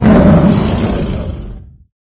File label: Monster; MapleShrine; mkxp; gameasync